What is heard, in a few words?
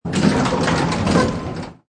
Office Building, Elevator Door Open; Doors